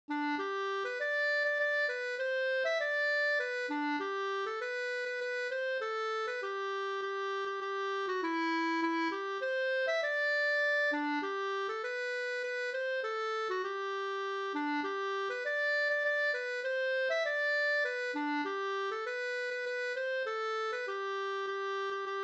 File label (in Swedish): vismelodi; En sommartrall av Johna Abrahamsson